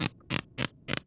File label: chewing